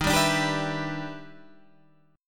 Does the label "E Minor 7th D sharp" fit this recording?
Yes